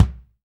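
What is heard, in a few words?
bass drum; Best Service Gigapack 2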